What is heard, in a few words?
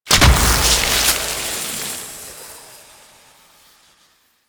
Gardening Plant Explosion; Warframe; Duviri